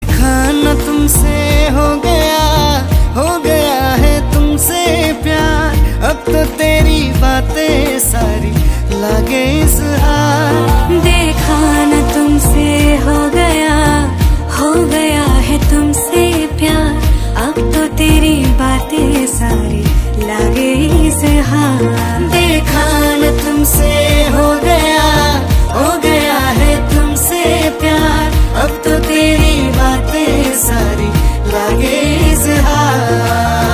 Ringtone

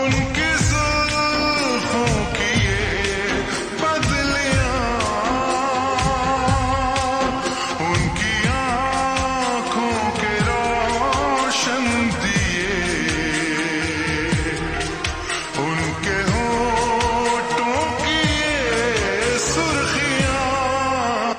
Slowed Reverb Ringtone

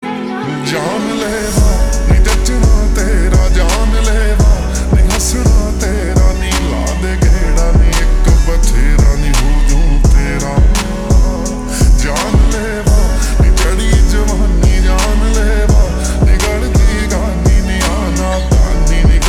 Slowed Reverb Ringtone

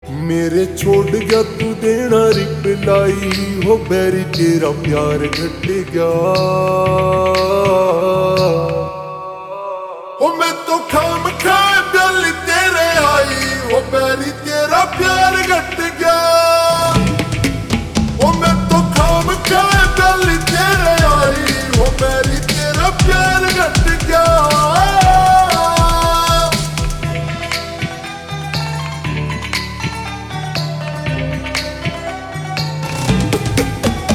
Ringtone Slowed Reverb

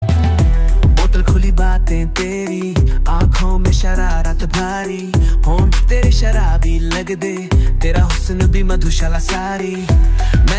Ringtone

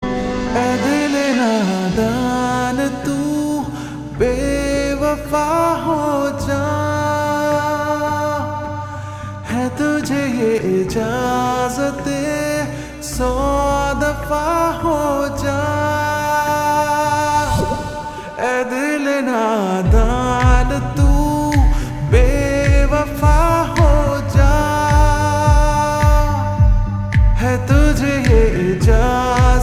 Slowed Reverb Ringtone

